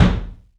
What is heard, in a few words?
ROOM KICK; 3D Drums